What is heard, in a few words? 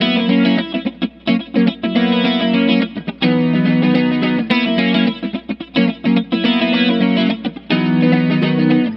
guitar, stringed instruments